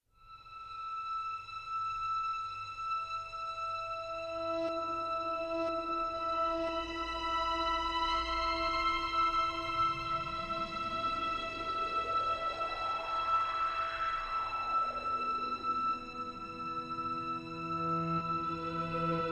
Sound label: Emptiness Ambient